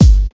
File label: rich mid range steel kick drum sound g key, Royality free bass drum single hit